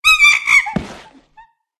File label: death